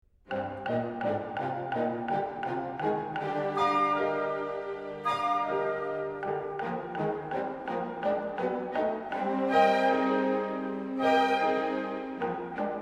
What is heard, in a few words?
Duet; Struthion